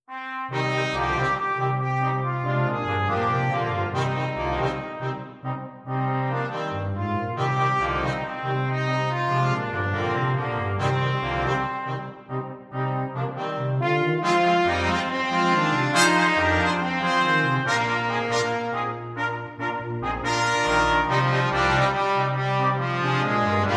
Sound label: rock BRASS, brass quintet